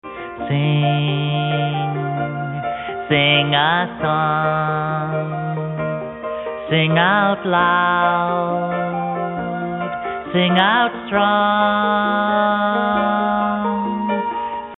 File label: big bird sing